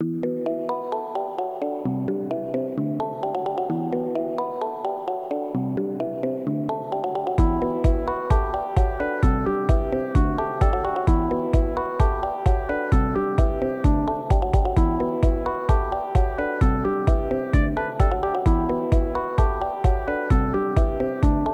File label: Background Music; Udemy Card Battler